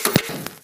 turnoff; Mini Games; Five Nights at Ronald's

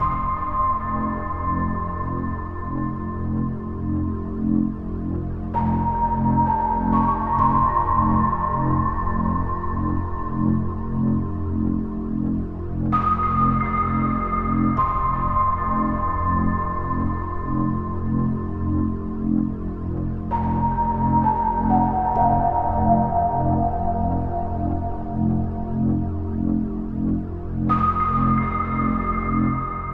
Prematch Loop

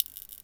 spark; teardown; ps5 windows xbox series xs

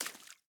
water land

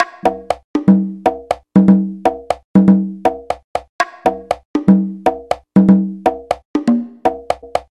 witchdoctor drum; Summer Catchers

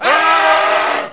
Yell

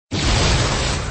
skill start; Endless World Idle RPG

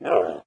Llama Man Scream

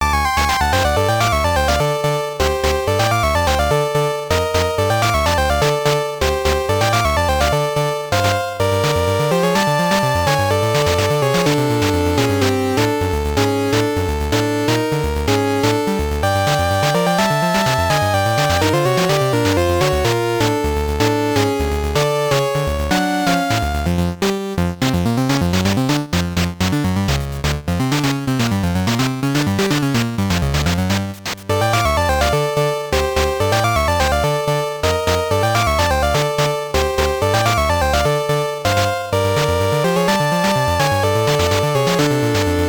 BGM, WonderSwan Color; Digimon Tamers Digimon Medley

